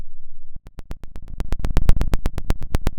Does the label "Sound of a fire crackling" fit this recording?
Yes